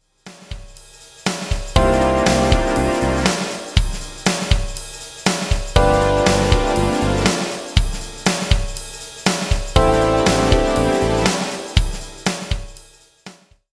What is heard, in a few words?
groovy